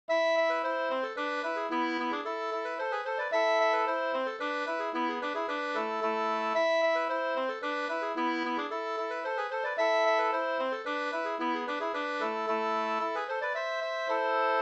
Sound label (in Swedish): slangpolska 2st